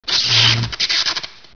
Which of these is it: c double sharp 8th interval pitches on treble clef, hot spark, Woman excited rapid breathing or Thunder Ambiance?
hot spark